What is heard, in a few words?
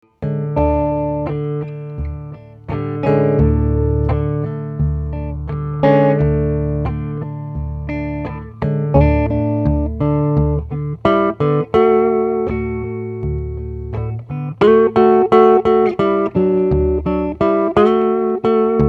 Sharp Drive Fat; Clair